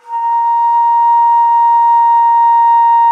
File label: T. BONSURI, India Winds; E-MU Producer Series Vol. 7 – Old World Instruments